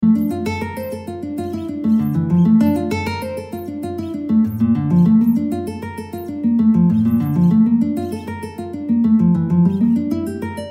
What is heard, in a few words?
Etude No2